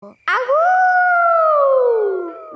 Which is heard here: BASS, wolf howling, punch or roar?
wolf howling